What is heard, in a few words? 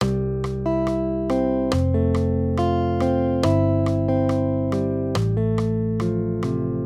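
Guitar, Accompaniment, Major Triads, C-F, 140 BPM; maj5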